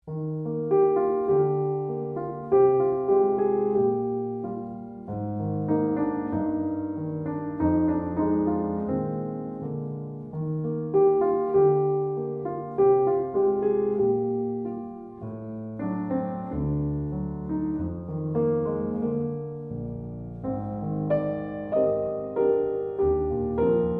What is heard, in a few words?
Solo Piano